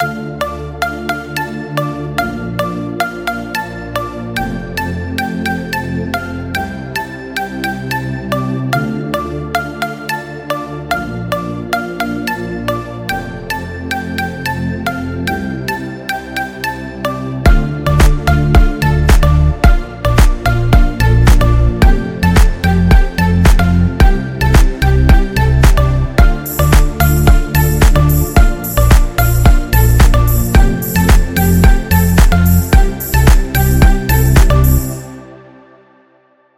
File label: Full Loop D